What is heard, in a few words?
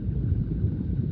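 underwater tone; PC Gamer IT CD 41; Daikatana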